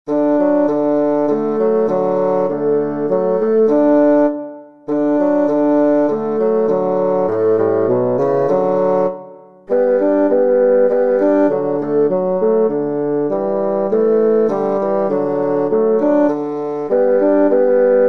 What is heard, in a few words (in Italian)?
Fagottino